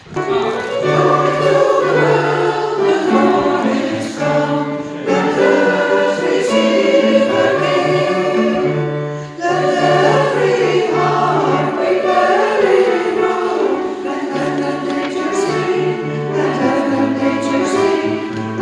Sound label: Alt Sopraan; Joy to the World